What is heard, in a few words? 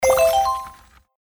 hit